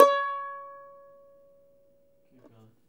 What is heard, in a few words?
BJN C#3; Sonic Reality; American Heartland